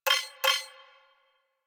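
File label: Hub Computer Beep; Warframe; Tenno Hub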